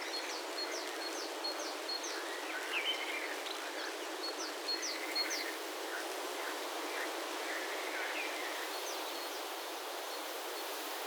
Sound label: exterior; inferno